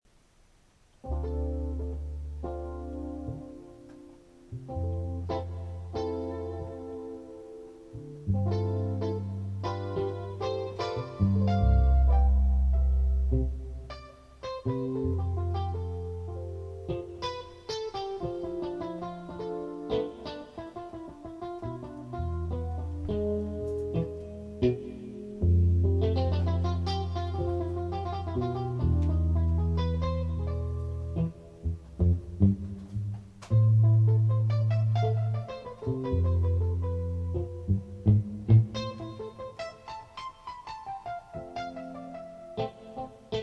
good night guitarrr strings are broken all of them